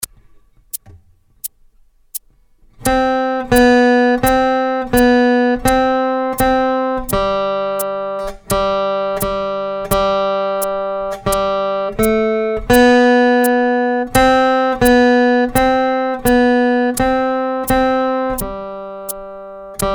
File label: Cassowary creeping slow